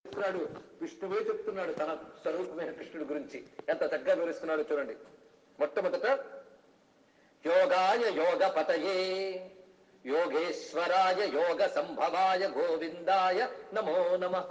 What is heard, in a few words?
Krishna mantram